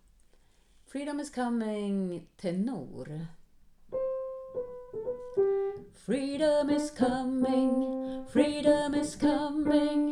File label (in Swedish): freedom is coming tenor; alla kan sjunga koren vi sjunger i höst